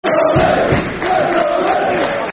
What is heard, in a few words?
Red 'n' Blue Army, Football Chants (Soccer Songs); Crystal Palace Cpfc